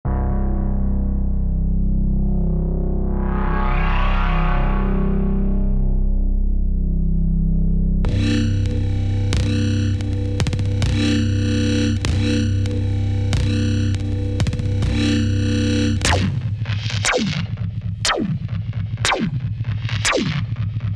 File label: Random LFO Organic